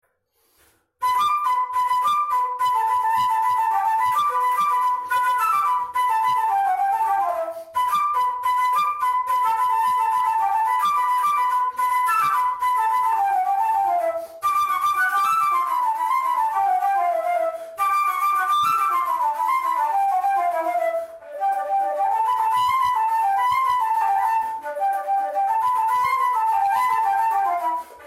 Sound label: flute